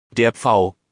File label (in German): Pfau; wildvogel